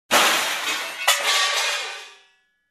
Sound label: Smashed Debris Sound